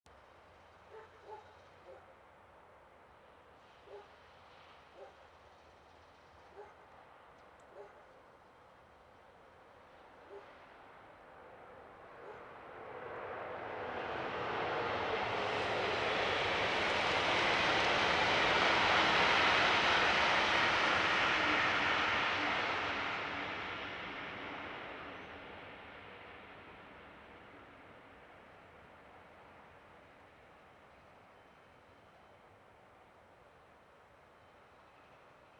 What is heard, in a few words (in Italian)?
Treno mono; Eigenmike; A-weighted; Nuova Strada